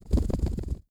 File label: bat flapping wings; wesnoth